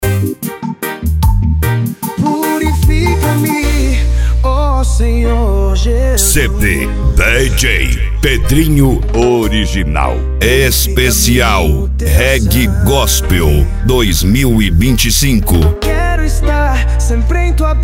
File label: reggae gospel